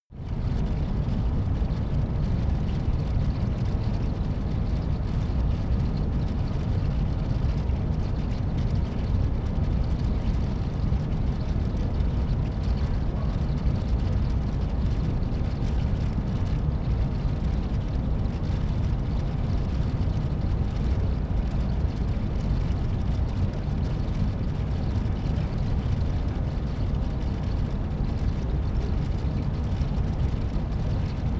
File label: office ventilator